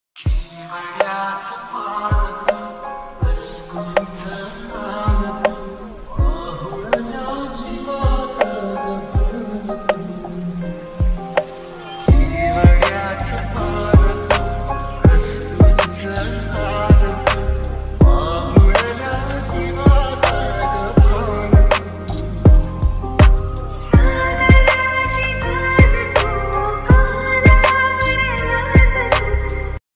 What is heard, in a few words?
romantic ringtone; hindi